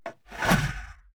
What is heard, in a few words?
fallen strike